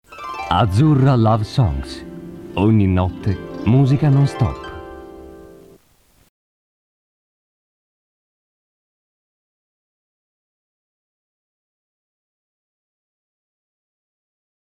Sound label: jingle